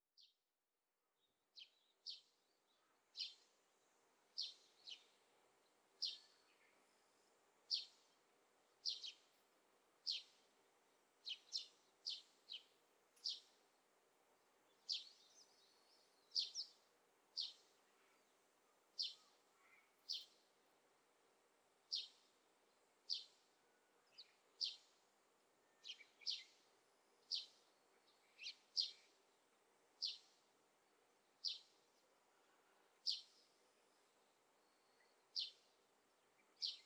昼間の環境音(夏)

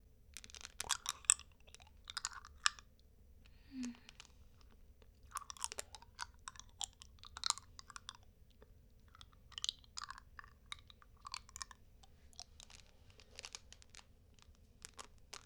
フェチ 飴 ループ用 バイノーラル

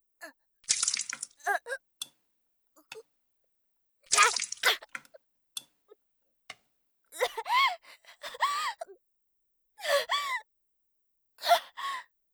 吐血; 効果音あり

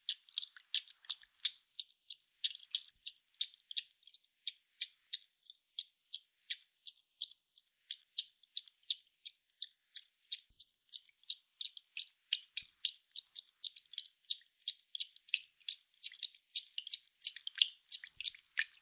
ストローク弱遅, 水音効果音

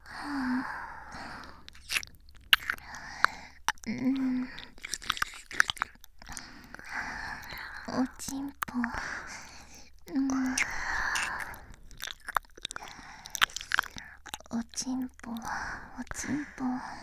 耳舐め

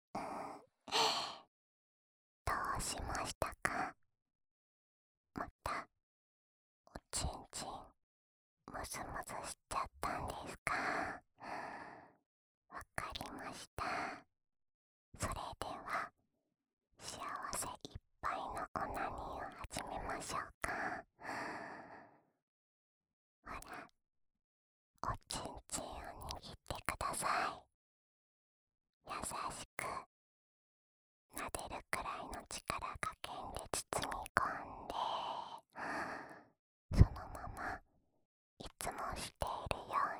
耳舐めループ（右耳）, ASMR; オナサポ用

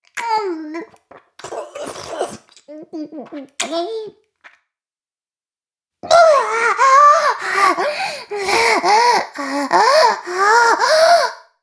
妹 フェラ音声

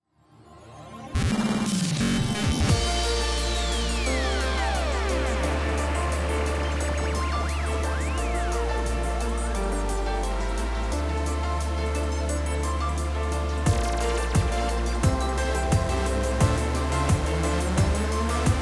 カラオケ

